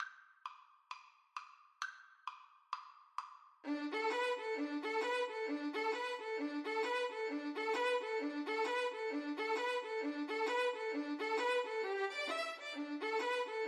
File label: jingle bells boogie